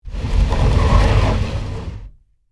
Secret Passage Way, Horror, Spin, Wood, Door, Open; Scary Soundscape; BlastWave